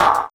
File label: Spitter Kick, Esoterik Beatz; Spitter Kit